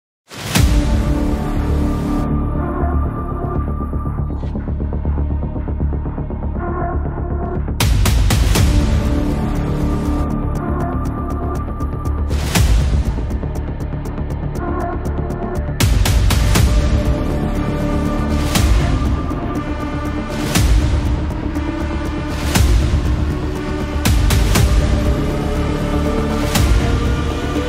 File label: epic cinematic intro